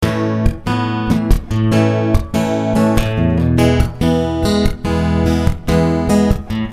Punchy A,E 72

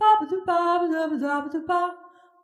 Jazzy Solo

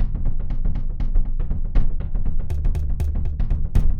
Mallimban C7 120

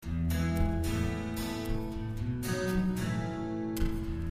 Plucking E,A,B,A 57

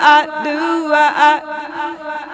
Vocal Echoes

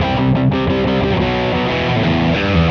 Interlude Rock E; Loops

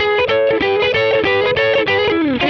Verse Partial Progression Boogie E; Loops

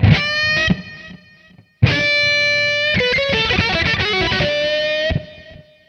E16 89 solo

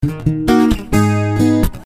Punchy Ebm, Abm 66

